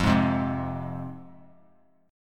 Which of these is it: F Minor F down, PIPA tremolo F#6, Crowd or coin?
F Minor F down